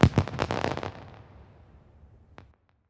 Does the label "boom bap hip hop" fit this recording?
No